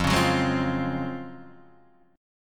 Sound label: Db Major 11th F